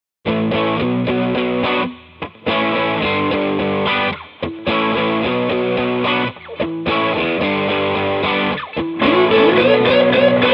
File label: delay, Analog Delay; Retro Sonic